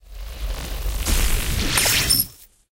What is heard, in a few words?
chest feature; goldentavern